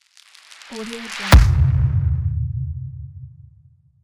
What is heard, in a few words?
cannon firing